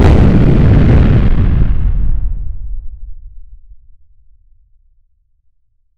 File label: sound of an atomic bomb, nuclear